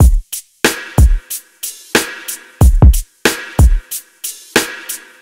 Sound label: hisssy snare echoes, Drum loops, Boombap